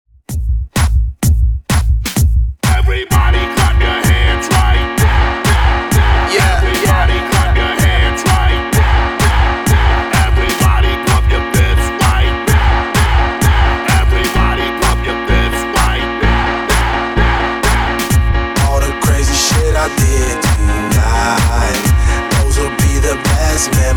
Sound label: Hype Redrum 128Bpm